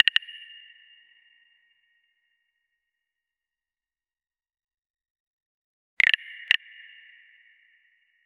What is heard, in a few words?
a meditative calm sound, wellness